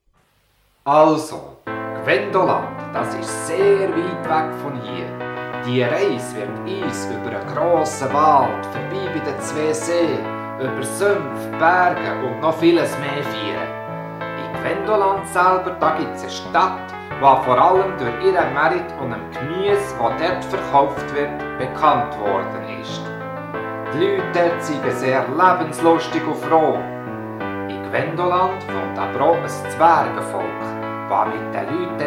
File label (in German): hintergrundmusik